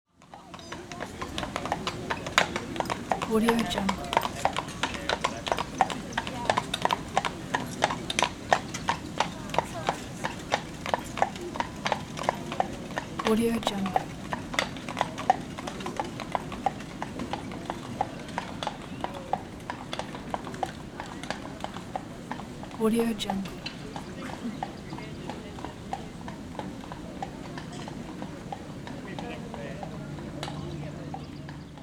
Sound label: horse drawn carriage pass by with people; nature sounds